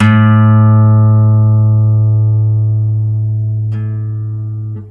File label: string; halflife; dm leveldirector